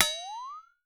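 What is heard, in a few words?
LARGE FLEX-, FLEX-A-TONE; Voice Crystal - Steve Reid Definitive Percussion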